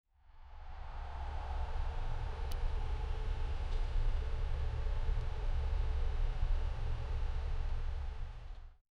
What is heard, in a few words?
vehicles